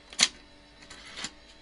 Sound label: insert driver